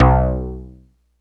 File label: VIOLATOR, MEMORYMOOG; Rubber Chicken Software Sample Bank